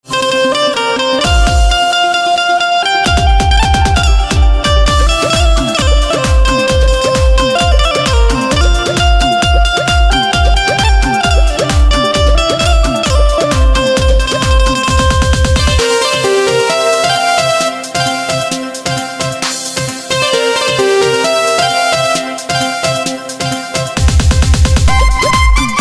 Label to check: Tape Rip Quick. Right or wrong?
Wrong